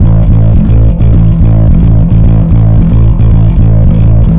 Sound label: Bass, Distorted A; Bass X